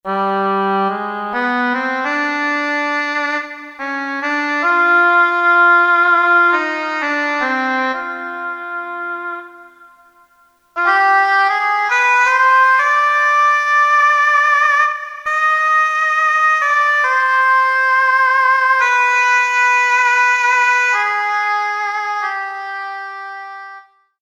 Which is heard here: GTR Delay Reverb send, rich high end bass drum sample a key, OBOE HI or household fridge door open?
OBOE HI